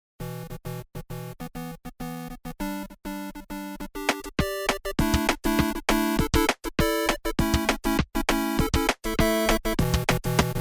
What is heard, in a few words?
Sawer Four Bits